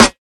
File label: sparkly snare sound a sharp key; snare drum samples